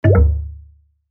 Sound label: Error; MSG Error; sonic-branding